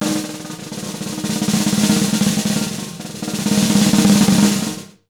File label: 100 B BEAT, Jazz Latin Drumloops; USB Soundscan vol.08; AKAI